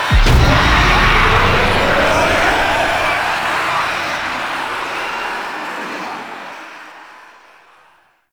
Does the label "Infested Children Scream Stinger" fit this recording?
Yes